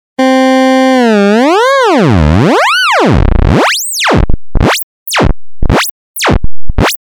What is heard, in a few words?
lfo vco